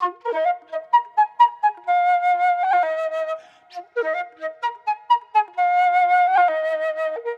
Flute Loop Wet 130 BPM D# Min, Processed; Melodics; Instrument Loops; Flutes; Cymatics; Cobra Hip Hop Sample Pack

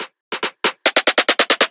Riddim Drum Fill 140

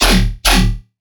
Riddim Bass Hit E

